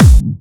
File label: Bassdrums Clubby Kicks; Vengeance Samples